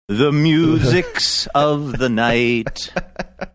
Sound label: singing the musics of the night